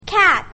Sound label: cat; grade1; short vowels